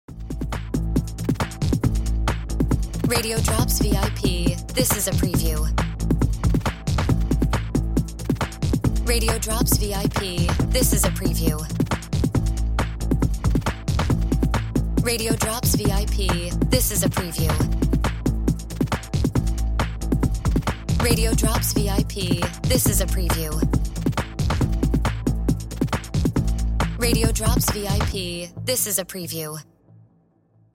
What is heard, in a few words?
No FX Music Bed